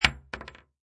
Bullet Shell Drop Machine Gun Hollow Wood

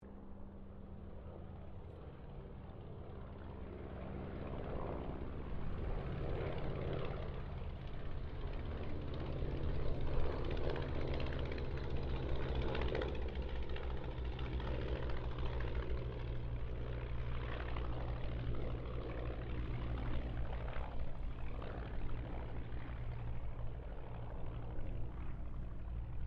AIRCRAFT RYAN PT22 PASS DISTANT; 1942; Airplanes (Sound Effects:Vehicles)

